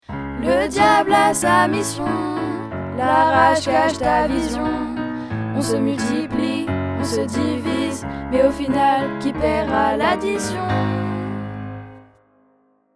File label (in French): chanson engagée